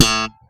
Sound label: ALEMBIC BASS POPS A2; Masterbits CLIMAX 7 - Bass